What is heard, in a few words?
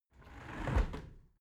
window close tilt